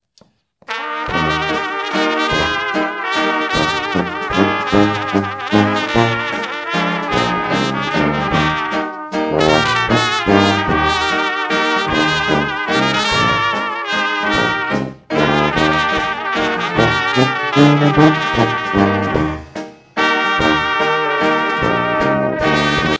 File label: WALTZ